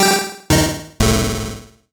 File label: MUSIC EFFECT Solo Chip C64 Negative; startide macos switch windows gamerip 2017